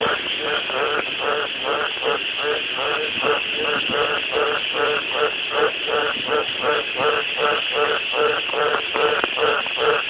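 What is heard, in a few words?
litoria caerulea, frogs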